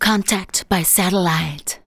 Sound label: vox, Techno Trance; Ueberschall